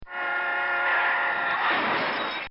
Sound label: Car Skidding; Vehicles; Bobby Cole